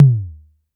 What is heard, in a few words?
Tom Low Mid, Drums; One Shots